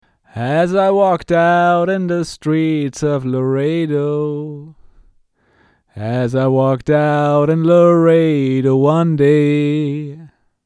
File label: Vocals SM7B RME